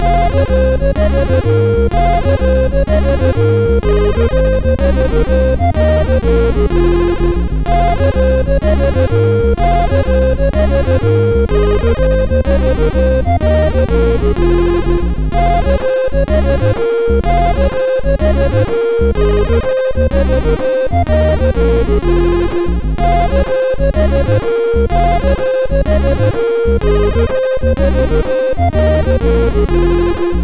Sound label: Medieval Introtune, sid MOS6581R4